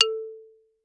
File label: Xylophone rosewood ff A4 stereo; MIS Pitches - 2014; Percussion